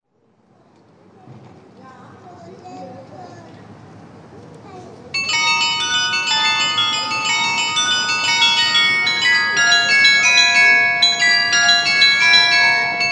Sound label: Scherzo, carillon